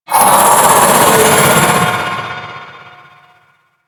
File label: Vocal Alerted; Enemies; Vocals; Warframe; The New War; Archon; Nira